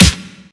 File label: snare one shot f sharp key